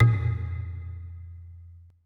E LOG #G, ETH DRUMS

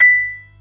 PERC E 4; B3 FULL

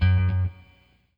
ROCK, Guitar

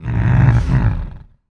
VOICE FX, Dance Vocals

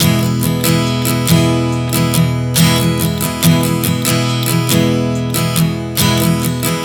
Strum 070 E, One Chord Strumming 070 bpm; Sonic Foundry (Sony Creative Software); Songwriter Acoustic Guitar Companion

